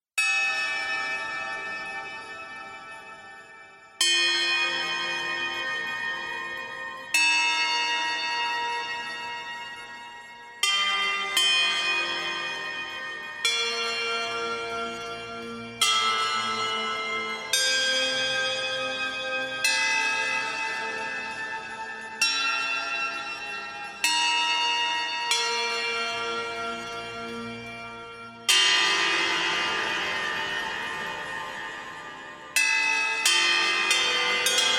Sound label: Bell Tower; Recorded live at home in Harlem, NYC